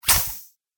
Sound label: Robot Snatch It; Robot Sound Effects; Sci-Fi Robot Sfx